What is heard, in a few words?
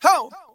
"ho", voice; ooh ahh